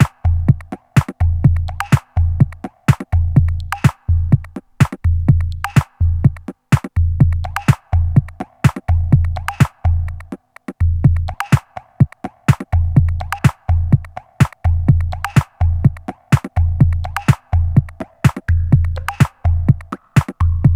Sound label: Minimal